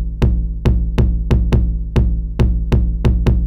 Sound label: 909 TOM, Techno 138 BPM; Best Service ProSamples vol.54; AKAI; HELLS DOOR